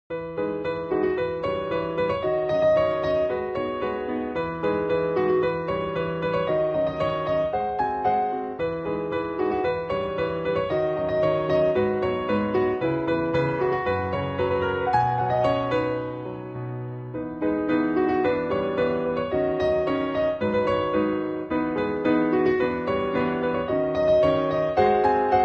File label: piano ver.